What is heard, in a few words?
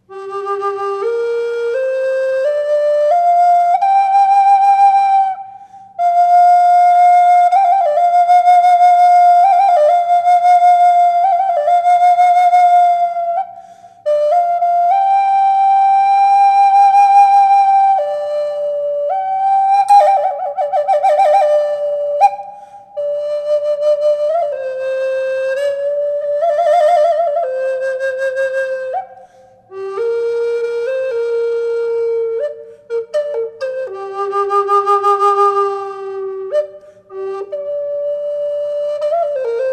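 reverb, flute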